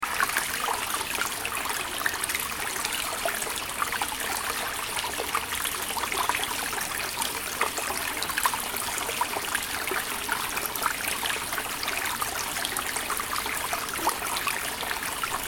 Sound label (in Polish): woda